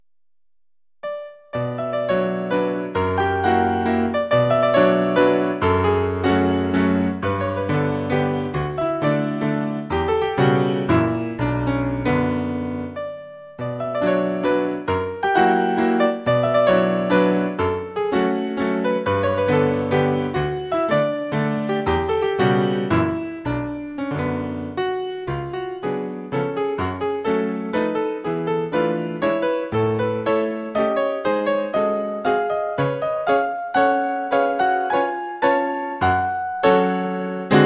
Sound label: mazurka